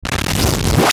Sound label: Ascend Crackle Distortion Warble; Blastwave